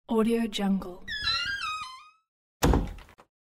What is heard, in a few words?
door locking